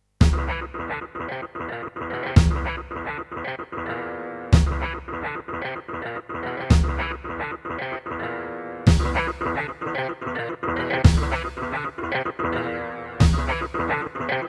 Casio CTK-2100; Doom

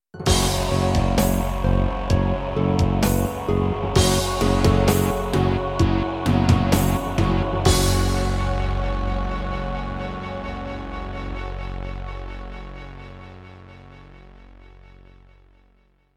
Introduction, Roland Edirol SD-20 GS MODE; Doom (Sigil)

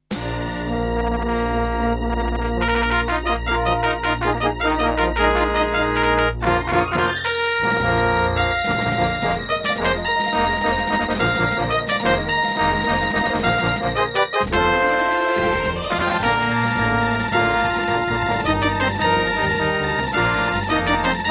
Intro, Terratec WaveSystem SCW001-2; Dark Forces

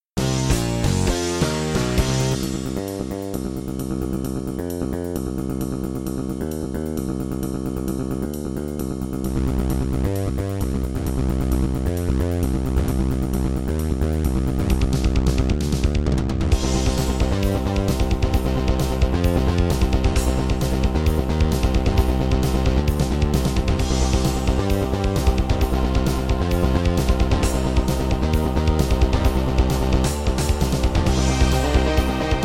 Korg X5DR; sigil doom episode 5

